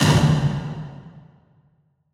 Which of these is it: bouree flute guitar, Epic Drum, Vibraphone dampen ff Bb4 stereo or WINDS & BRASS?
Epic Drum